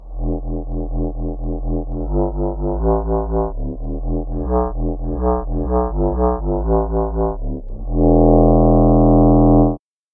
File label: clarinet bass